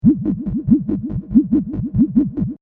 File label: Space fx loop, Spaceship Environment Style Loop, Short; Sci-Fi; Stuart Duffield